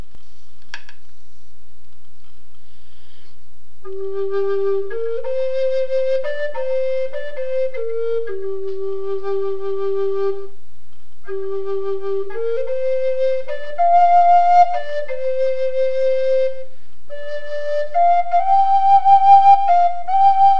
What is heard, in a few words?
flute